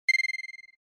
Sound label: SNES Shooter Item